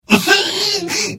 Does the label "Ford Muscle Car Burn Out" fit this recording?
No